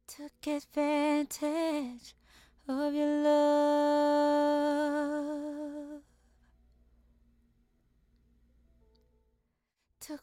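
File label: pop rnb, 97, E minor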